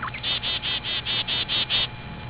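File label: chickadee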